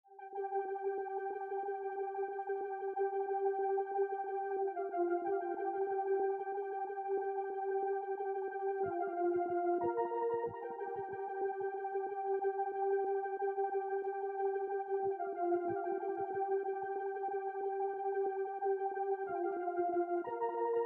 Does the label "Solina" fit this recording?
Yes